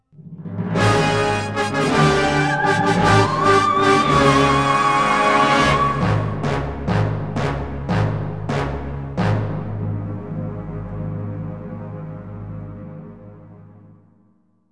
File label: Dramatic Intro